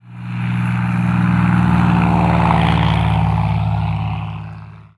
small aircraft propeller fly by

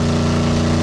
diesel engine

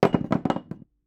metal drop wood

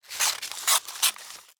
scissors paper cut

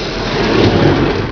SLIDING

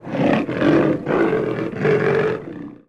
beast growl

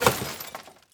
windshield break

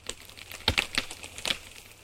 branch breaking

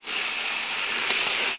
adhesive tape rip

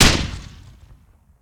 grenade explode

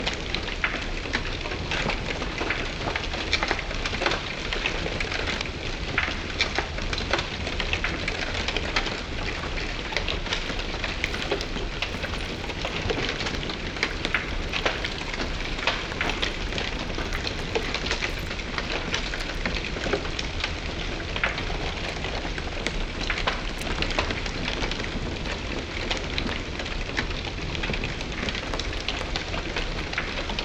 wood burning

